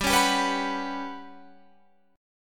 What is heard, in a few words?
G Major 7th Flat 5th